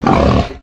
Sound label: boar idle; monsters